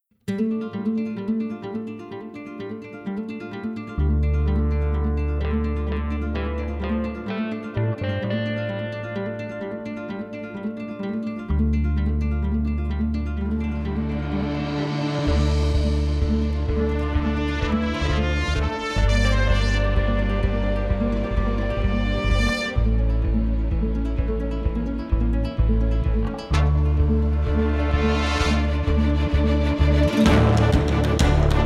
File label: Opening Kayak Music